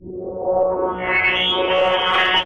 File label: Modulated Buzz Transition